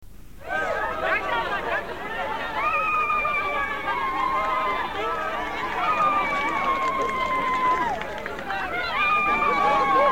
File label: Cheering and whistling